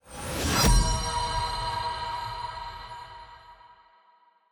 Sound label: notif your ban; draft; champ select